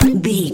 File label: weapon fun pea shooter